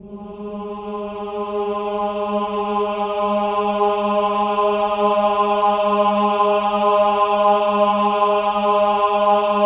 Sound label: OH-AH G#3, choir; Spectrasonics; Symphony of Voices